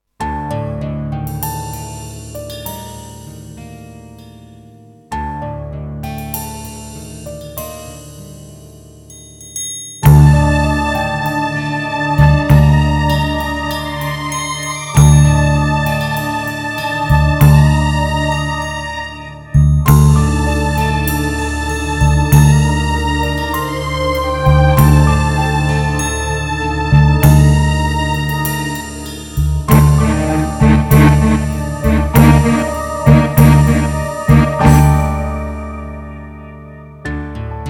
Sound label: Instrumental